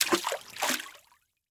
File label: Liquid Water Water Water Balloon Dropped In Water